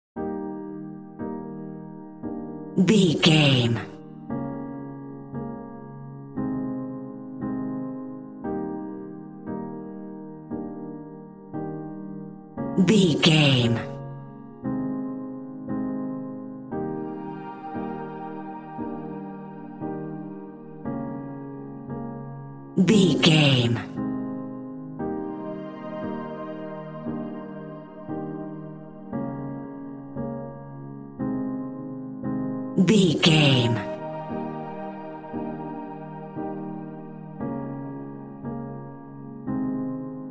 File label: relaxing piano